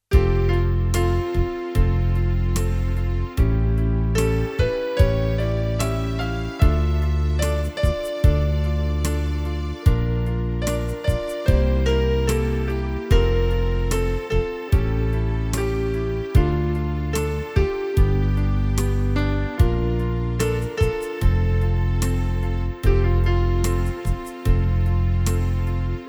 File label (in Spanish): organo